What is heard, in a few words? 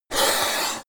yeti hiss weak; kreaturen